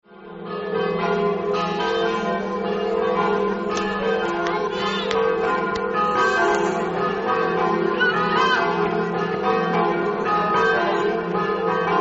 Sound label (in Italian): ruttars, campane